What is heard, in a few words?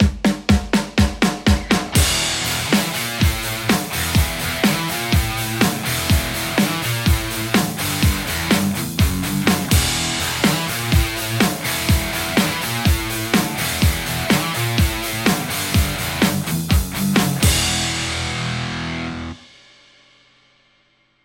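Stratocaster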